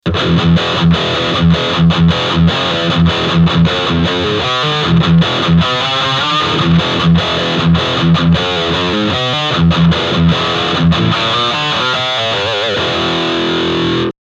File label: Overdrive